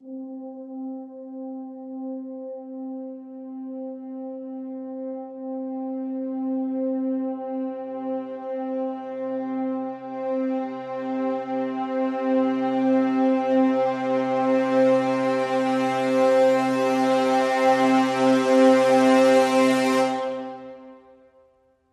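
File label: Crescendo Brass Swell Hi (C)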